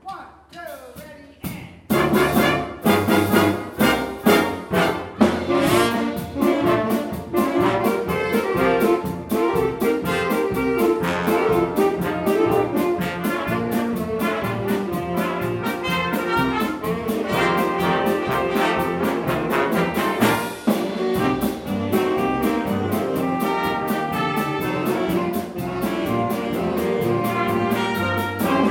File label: Polka